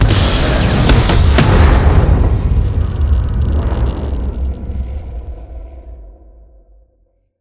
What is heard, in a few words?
bt hotdrop to embark idle short; Titanfall 2